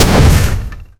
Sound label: magic